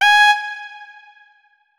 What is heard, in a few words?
notes, saxophone; Hideout Furniture